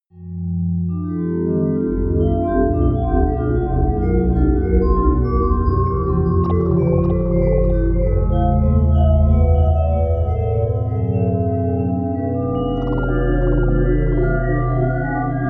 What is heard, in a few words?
creepy bells